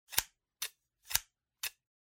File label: Scissors Snip Snap